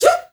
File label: FEM GRP, FEM NOISE; Spectrasonics; Heart of Africa